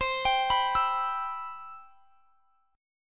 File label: C Major 7th, chord; CM7 arpeggiated